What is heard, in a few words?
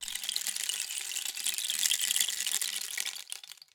Rain Stick, Percussion Samples